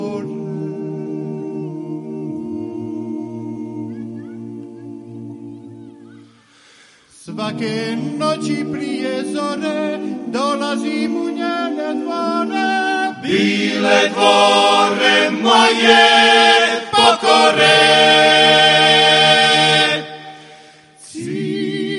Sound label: Acapella